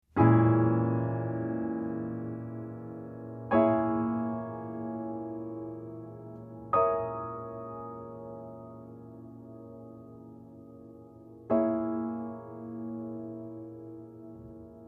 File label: Bbmin7b5